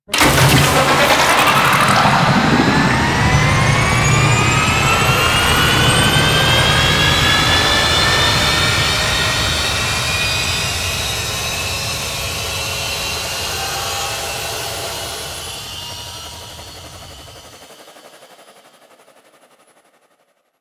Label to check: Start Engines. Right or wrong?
Right